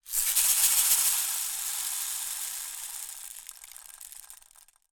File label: toy rainstick shake then tip